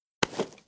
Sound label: click